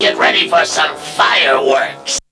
VOX; Zero G; Man Machine

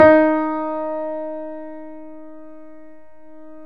PIA GRND, Roland; Sound & Vision; Gigapack I CD 2; PIA GRAND 2; PIA Grand 6

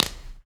Body Perc

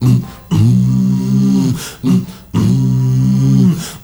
MALE HUM, M. HUMMERS; Spectrasonics; Heart of Africa

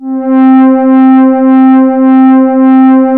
MOOG PAD; AKAI S-Series CD-ROM Sound Library VOL-2; SYNTH

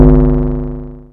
SPACE DRUM

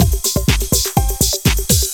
LOOP 124; Sounds Good - Eurotech

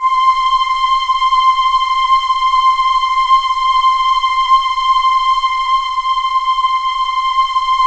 C6, Synth Leads; 90 s Sample CDs; NiceBeats (NiceBytes); Studioline 09

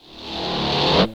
GTR CHUCK, E.Guitar FX; Roland LCDP02 Guitar and Bass

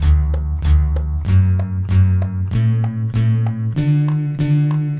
Popcorn Strum; Sonic Foundry (Sony Creative Software); Club Joints and Street Anthems; Toxic Rain

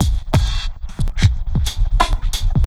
Orbish Funk, Synchro Funk, Beats; Sonic Foundry (Sony Creative Software)

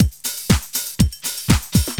LOOP120; Sounds Good - Eurotech

